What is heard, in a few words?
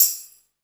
PERC -R, 168 BPM Drum & Bass; Ueberschall; Drum & Bass Resonance